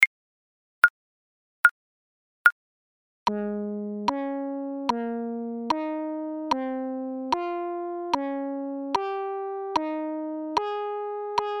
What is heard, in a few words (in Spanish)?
Entonacion Intervalos Escala Mayor Cuartas